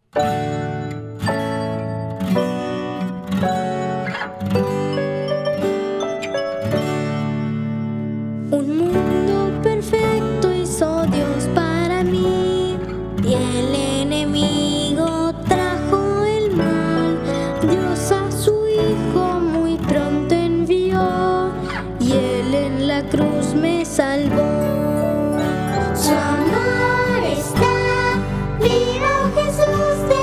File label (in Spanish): canto el amor vive ninos; semana santa